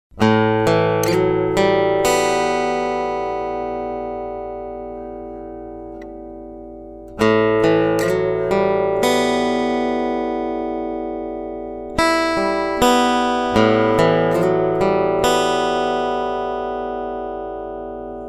sustain obertöne

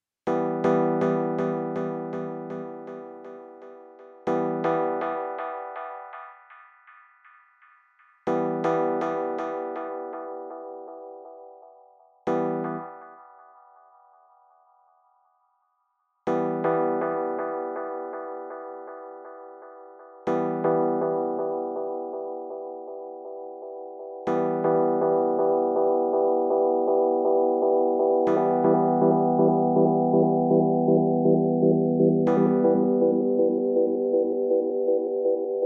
Filterkontrolle

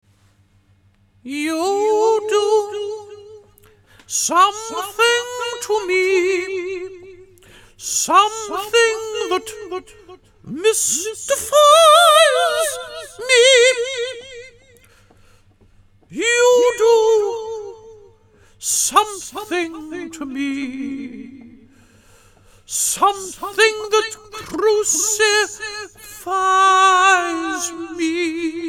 Gesang DELAY; LXP-1

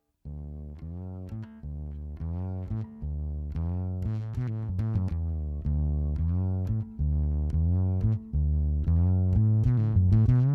Bass Unteres Band Boost Und Cut

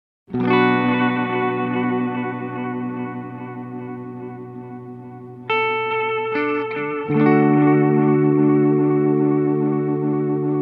Echo Shifter ES3 Analog Oszillation; Ibanez

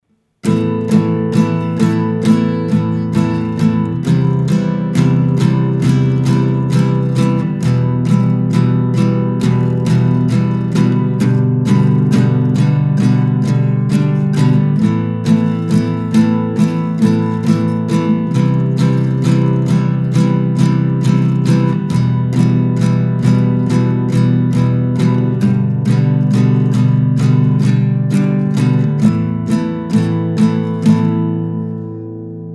lagerfeuer aufgenommene Gitarre; mit AKG 220

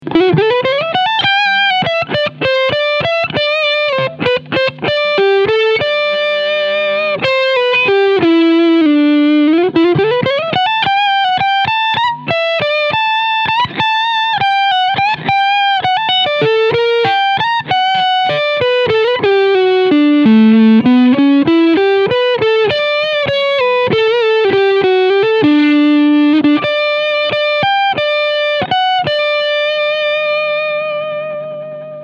Squier Telecaster Leadsound beide PUs; 40th Anniversary

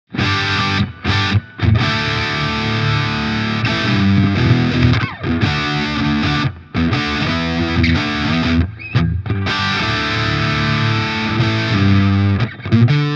Gretsch G5422TG Electromatic Steg viel Zerre

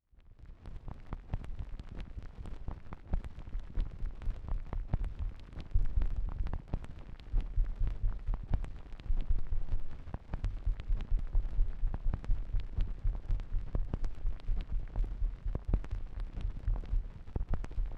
Klopftest Stanton Technics SL 1210MK2

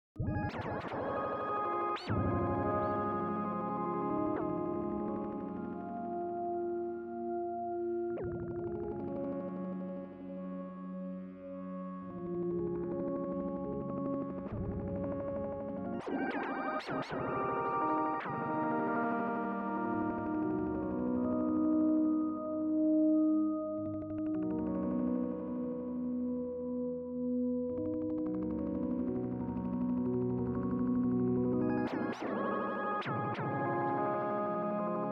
TC2290 DT Orgel Space Delay

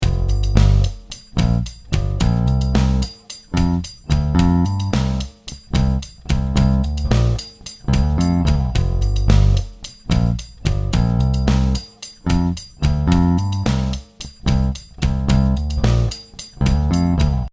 viking stellung 3; hagestrom

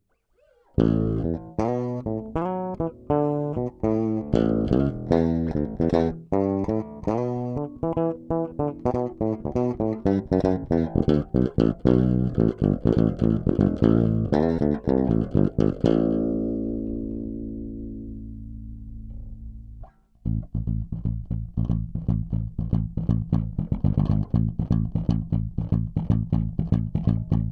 E Bass alte Rounds ohne Kompression

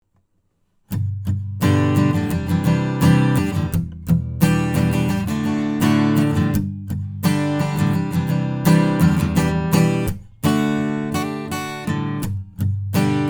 telefunken m60 aufnahme gitarre niere

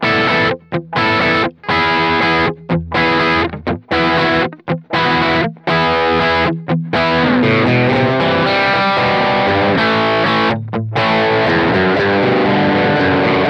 Soundvergleich Crunch Ibanez RG631

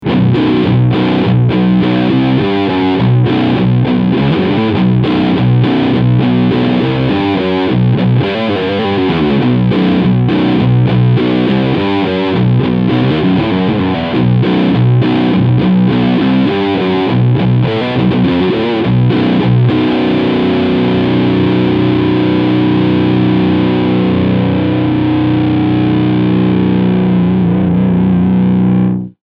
Charvel DK24 7 Riff Sound Steg Humbucker

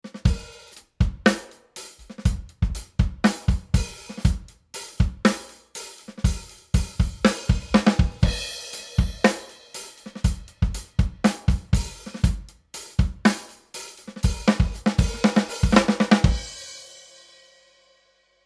Drums ohne Comp.Two

